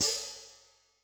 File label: Rok Ft Sp, HiHat, Yamaha DTXpress-II